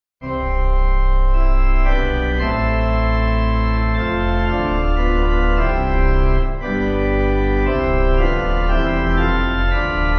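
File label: Pipe, Pipe Organ; We Sing Of God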